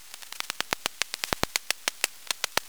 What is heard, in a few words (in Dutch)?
bruinvis geluid